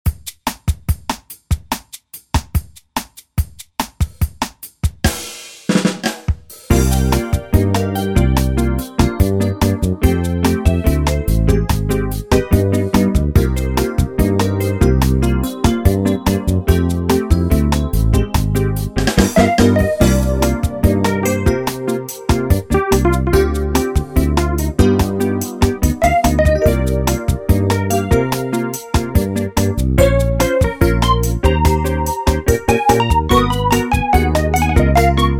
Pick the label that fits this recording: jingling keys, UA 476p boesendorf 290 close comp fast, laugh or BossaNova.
BossaNova